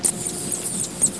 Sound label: birds